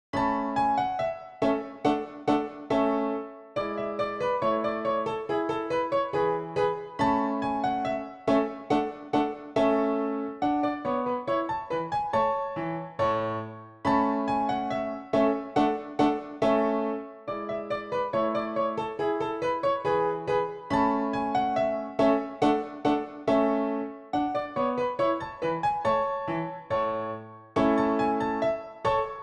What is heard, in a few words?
Dance PianoTeq Bechstein; Dittersdorf 20 English Dances Schott; Dance No15